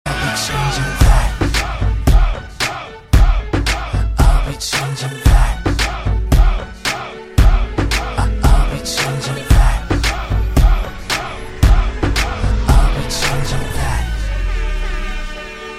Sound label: tonight beats, hip hop ringtone